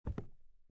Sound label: step wood; Out of Reach: Treasure Royale; 2020 gamerip pc